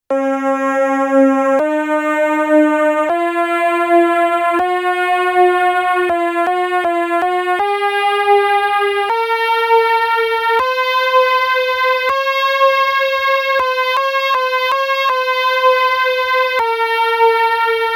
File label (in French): gamme majeure pythagoricienne